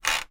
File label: household electronic key hotel room door lock or unlock